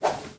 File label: Swoosh